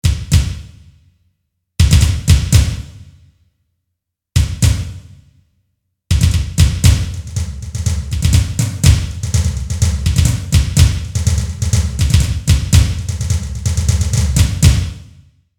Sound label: thick build up